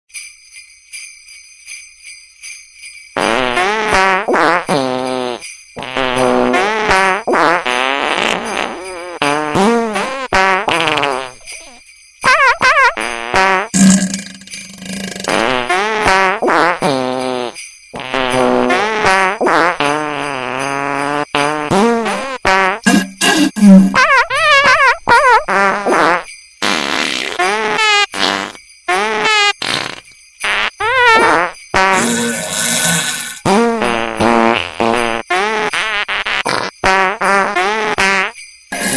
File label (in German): Jingel Bells auf der Toilette